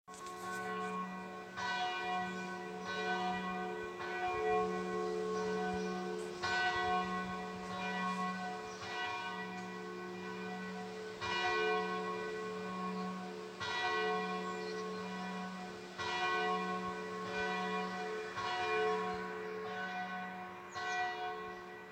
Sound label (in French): cloches